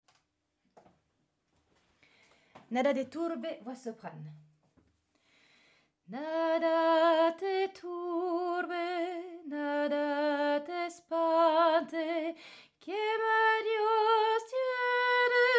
Soprane

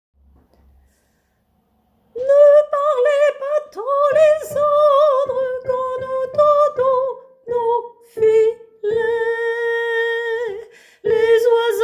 lysandre sop

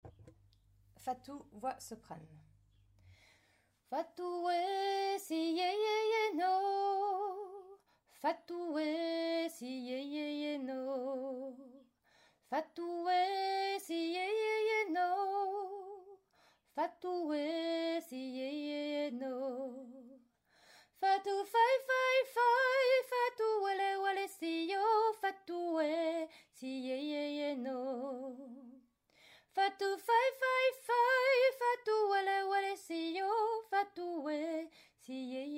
Soprane

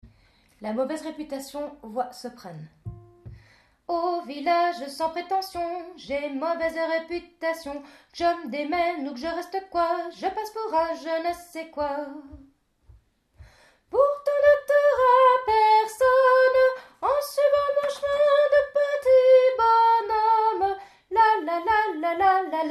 Soprane

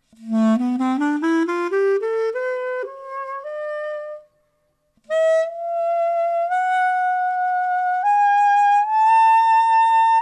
clarinettes

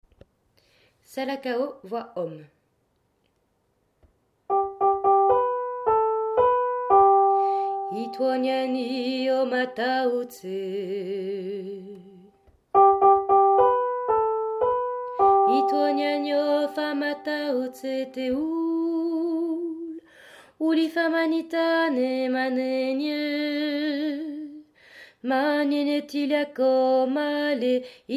Salakao Homme

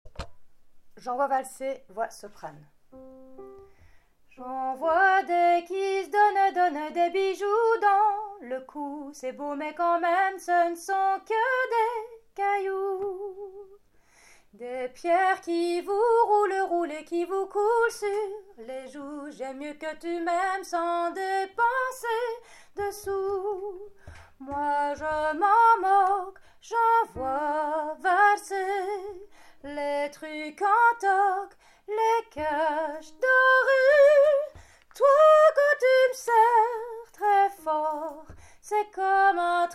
Soprane

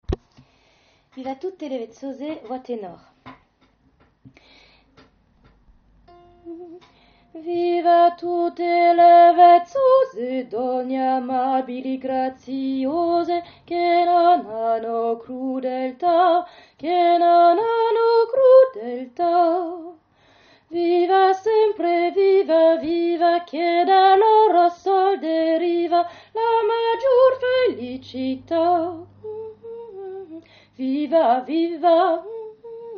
Tenor

